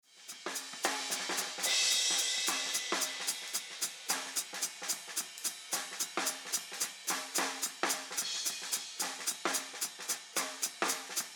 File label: Drums HH Ride HH Comp On